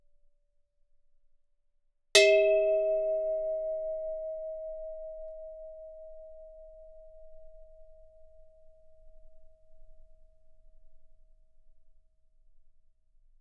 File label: Gamelan; Propeller Island - Complete Gamelan